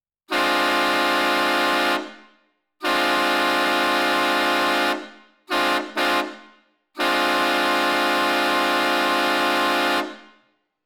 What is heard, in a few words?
Bell; Shocker 4